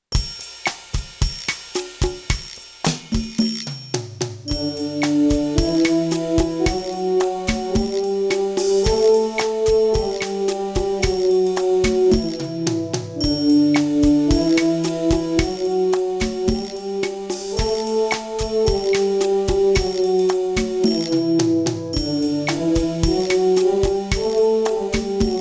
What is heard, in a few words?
G scale in F, brass, practice